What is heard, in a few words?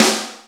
SNR ROCKE, snares; Roland L-CD701; Sn Modules 7